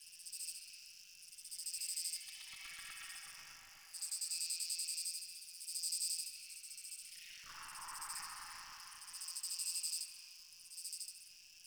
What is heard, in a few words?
Critter Ambient Stereo Loop; Props; Warframe; Tau; Ambience